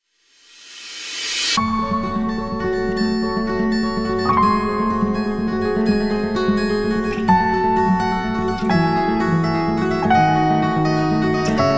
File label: Latin Band; Washington DC